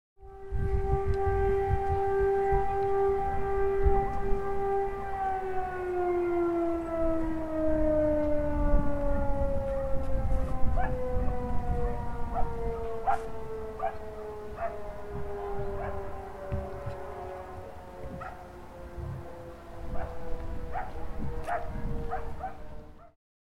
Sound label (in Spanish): Sirena desde cerro; mapa sonoro